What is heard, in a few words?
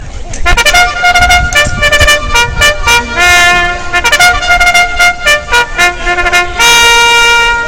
horse race